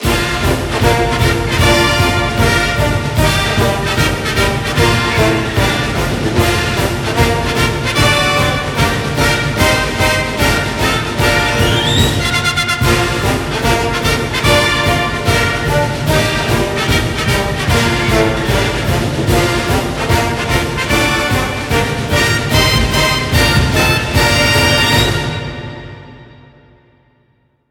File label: Fight Song; NCAA March Madness 08; PS2, PS3, Xbox 360